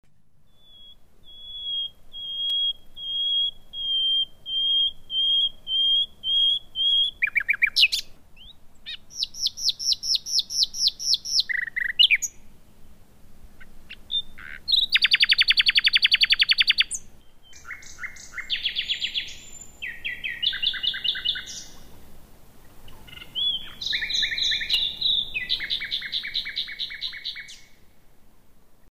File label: nightingale